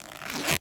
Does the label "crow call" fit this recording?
No